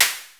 noise hat; AMG - Vince Clarke Lucky Bastard

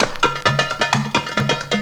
percussion, 130, drum loops; Ueberschall; Techno Trance Essentials; TRIBAL

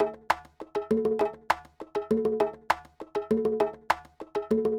Cosmic Congas, processed; Sonic Foundry (Sony Creative Software)

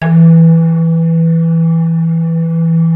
MALLET SYN E2; Greytsounds VOL-1; VFX

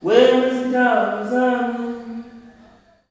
wey oon, male; Spectrasonics; Heart of Africa 2; S.MALEAMB

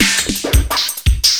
LOOP SD, Drum & Bass, 170 BPM; Ueberschall; Drum & Bass Flash Trackz

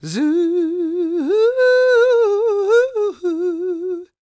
CROON, Soul

